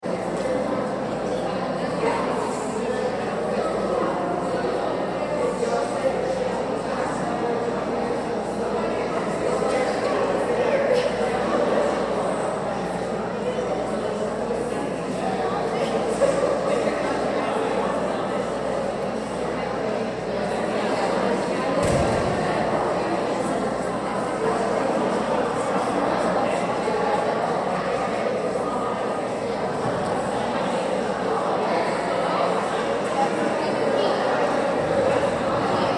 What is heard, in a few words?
Crowd Women's Conf Lobby Activity; Women ' S Conference; Crowds; Backgrounds; Crowd, Int, Women ' S Conference, Lobby, Activity, Bg Doors